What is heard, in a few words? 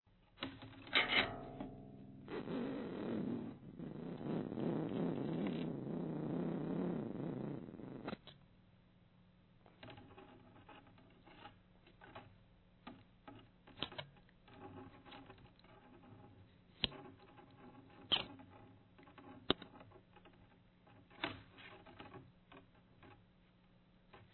hammer